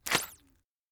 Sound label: jump slippery; fallguys